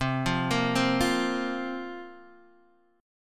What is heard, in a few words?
C 7th Suspended 4th, Guitar, Drop D